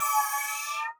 floating to target, upgrade

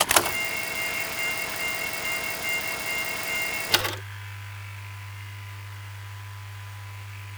broken machine; CrossCode; basin keep

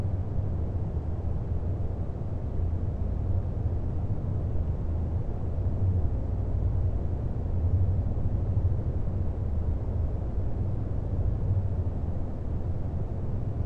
ambience spacewalk; The Swapper

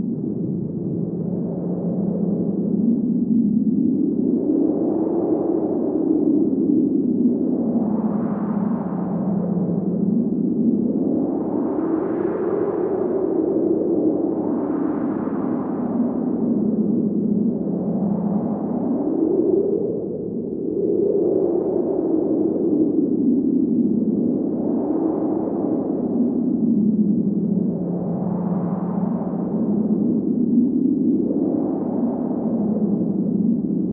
strong wind; CrossCode; background

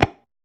footswitch up; The Swapper

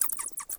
batsqueak; World Of Goo

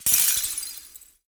instamatter break

